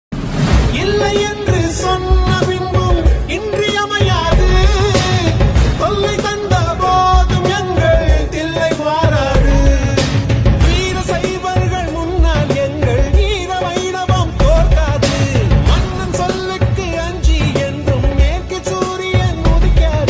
tamil song

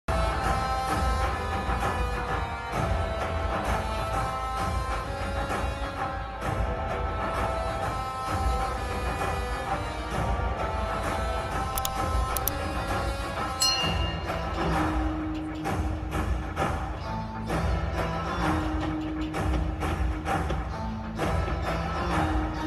ambajipeta marriage band ringtone bgm

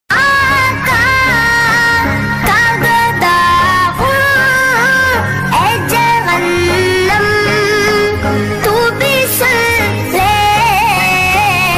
islamic ringtone naat sharif caller tune

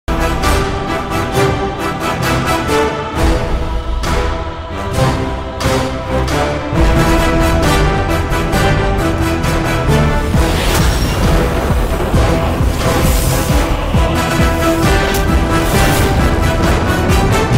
bgm ringtone; mersal

